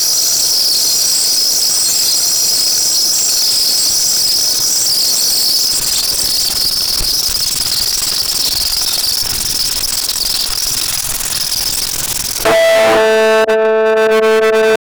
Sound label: weird classic sound of rob, digital